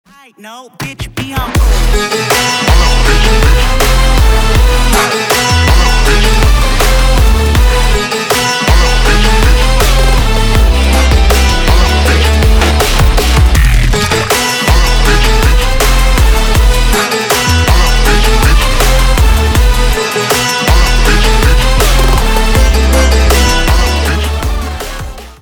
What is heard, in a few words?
trap